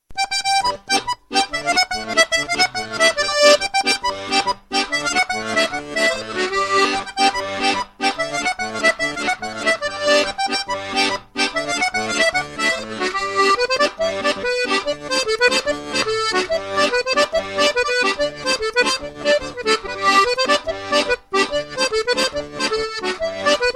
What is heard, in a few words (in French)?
accordeon diatonique; instruments